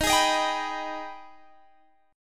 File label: Ab Minor Major 7th